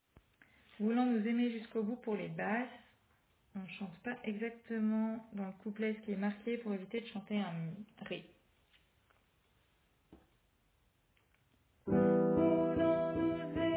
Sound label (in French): Basse